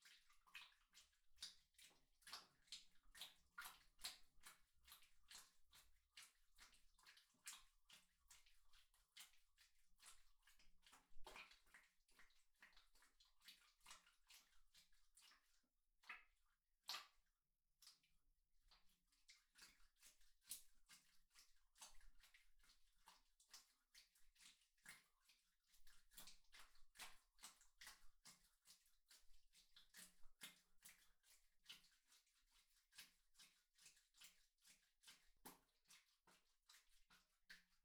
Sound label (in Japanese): クチュ音 棒 中速; KU100; アダルトな音